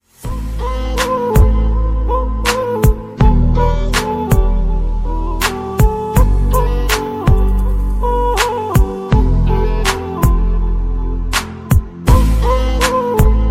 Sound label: Samsung Galaxy Whatsapp Call Ringtone